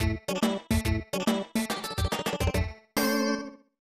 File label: Unknown Jingle; Lupin the 3rd 1994; SNES